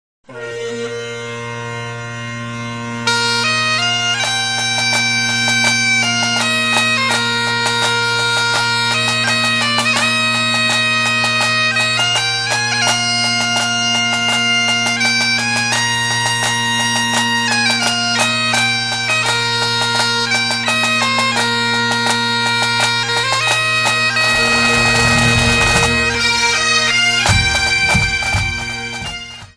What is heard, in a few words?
Kilted Music; HIGHLANDER